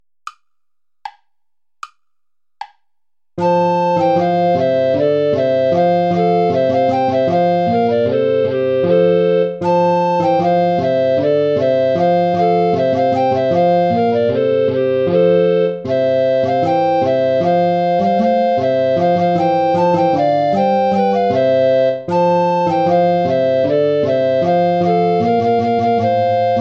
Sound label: deck the halls play along without soprano recorder; traditional